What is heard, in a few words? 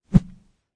snatch